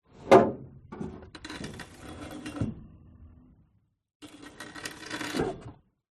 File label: warfare tank matilda mrk 2 gun elevate then lower engine off